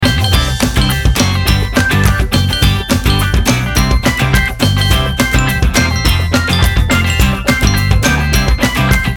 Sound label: Happy Birthday (Pop rock) Loop